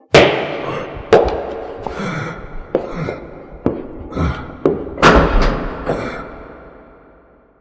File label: scream; svencoop